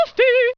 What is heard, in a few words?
Game Ringtone